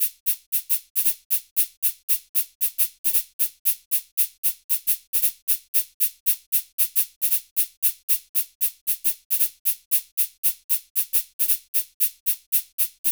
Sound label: Shaker 115 BPM